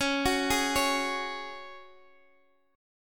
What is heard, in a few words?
C sharp Suspended 4th, Guitar, Open D